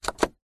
vehicles car suv nissan patrol diesel 1998 internal dash button press